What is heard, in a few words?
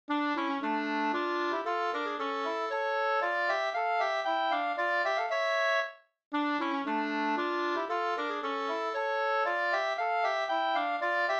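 polska, two-part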